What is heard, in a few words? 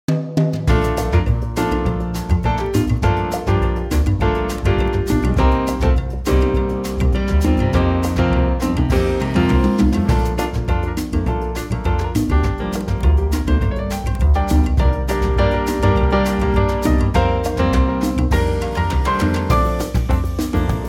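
latin Bb, backing track; key - Bb - vocal range - A to Ab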